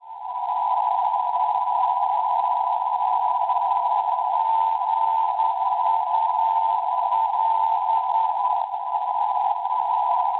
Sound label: NDB Gulpen Paesi Bassi 383.5 kHz; Schwarzsee